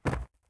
jump; RYL

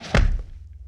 Swipe & Punch; Sony Pictures Sound Effects Series

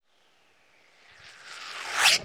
Cannonball Whiz By; Period Combat; Sony Pictures Sound Effects Series

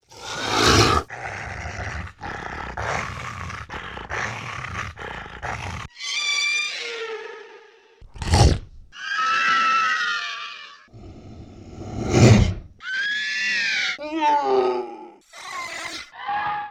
Lizard Creature, Vocals - Monster; Sony Pictures Sound Effects Series

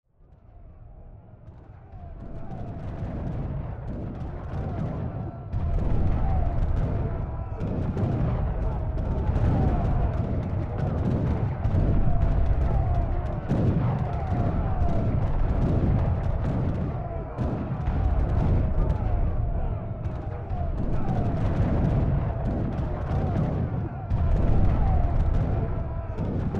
Fighting; Age of Empires III; cinematics

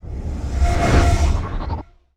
whoosh synth composite; Sound Design - Horror; Sony Pictures Sound Effects Series Volumes 1-10; Sony Creative Software

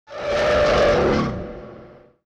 Roar & Scream, Vocals Monster

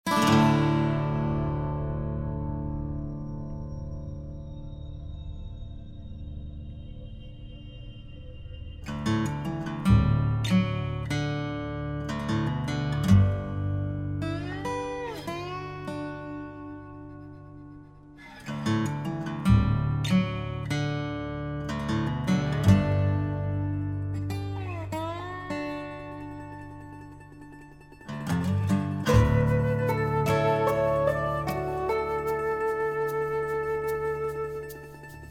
Music; Age of Empires III; cinematics

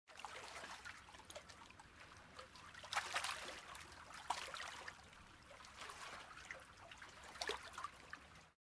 Boat Water; Age of Empires III; cinematics

